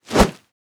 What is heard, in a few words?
Whoosh